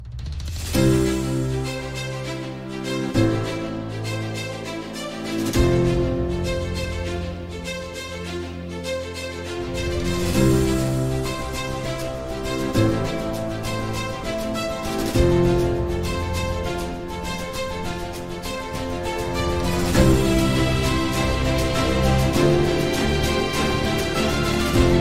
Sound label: Inspiring Opening Ceremony Background Music